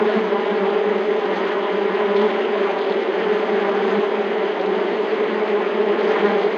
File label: Flycaller Flyies Loop